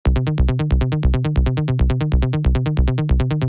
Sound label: Bass Midi trance